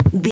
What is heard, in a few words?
footstep wood walk